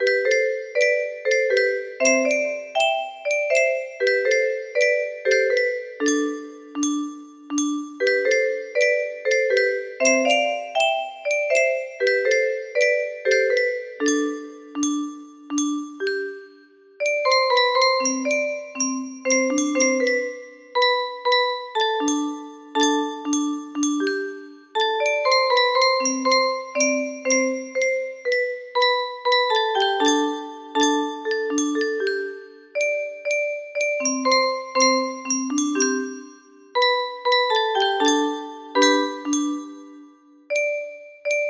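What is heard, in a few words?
music box melody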